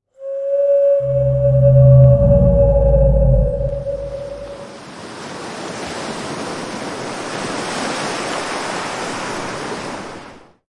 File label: Whale Attack; WhaleAdventure VR